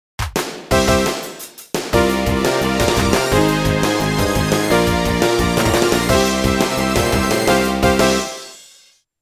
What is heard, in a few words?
Mission Complete (All Stage Clear); Stahlfeder